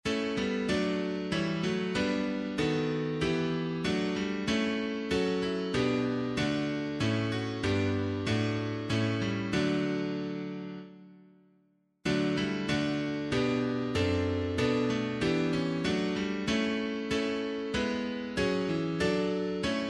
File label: piano, hymn